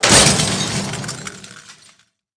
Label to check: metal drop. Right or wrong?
Right